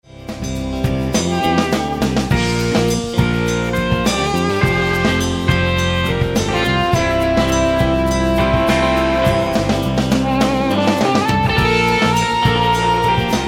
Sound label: fulltone octafuzz